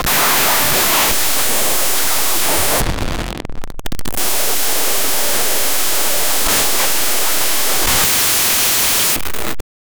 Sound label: loud jumpscare and glitch